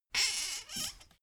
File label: folding chair squeak